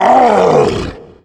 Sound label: Tank Fire; cstrike; zombie plague